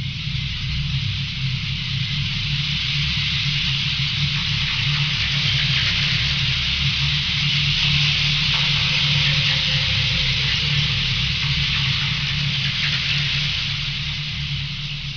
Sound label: bats leave cave